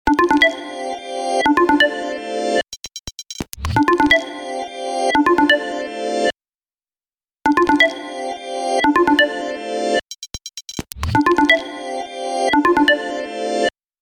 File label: OnePlus Nord Stock